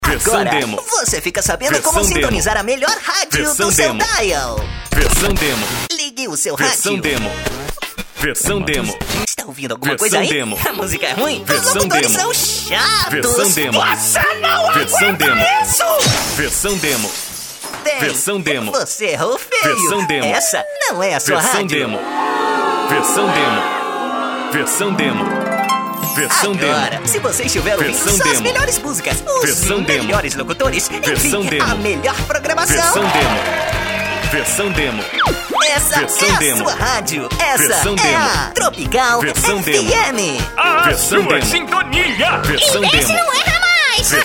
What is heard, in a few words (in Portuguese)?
vinhetao happy